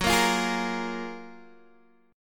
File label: F sharp Major 9th, Guitar Open D